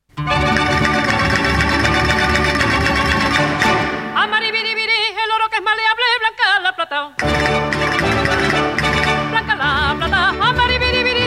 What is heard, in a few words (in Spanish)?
Sevillanas Repique